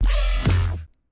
stab

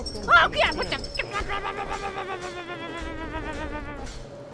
kat noise

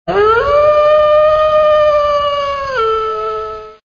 wolf howl sound effect

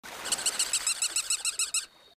laughs; bard

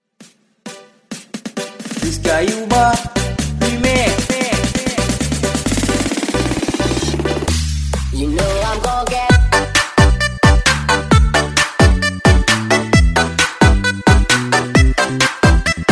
coffin dance short

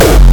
hardstyle kick

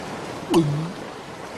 goofy guh sound

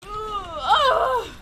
moan

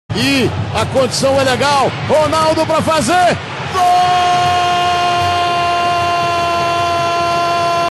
iiiiih

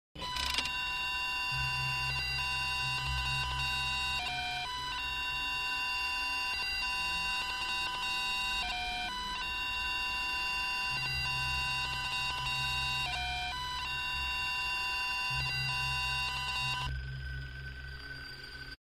creepy music; puppet 3; fnaf

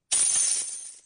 coins

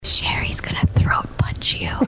throat punch; throat punch meme

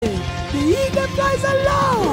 the eagle voice

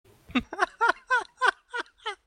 fox

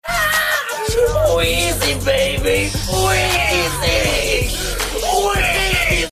wheezy

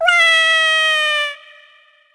fall; Pikmin deadfall

